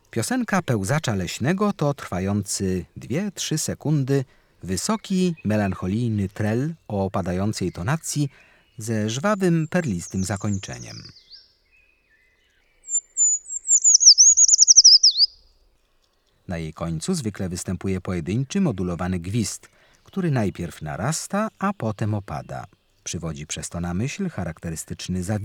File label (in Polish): Pelzacz lesny, Ptaki; Kurs PTTK